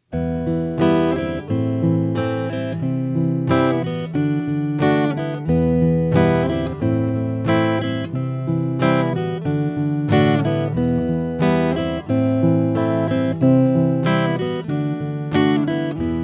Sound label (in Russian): Boj s pereborom